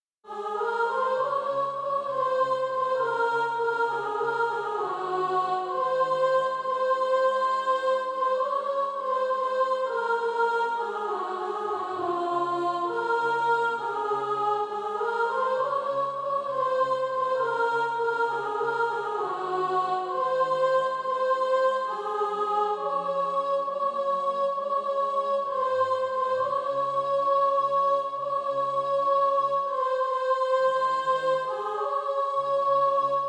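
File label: sop, Practise Music; Christ Has Arisen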